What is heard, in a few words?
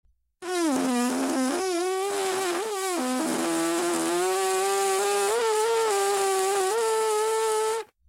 fart; pubg